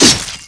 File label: attack ancient guard warrior; RYL 2 v1673; Private RYL